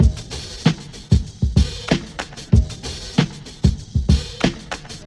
Drum Loop

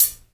Closed Hats

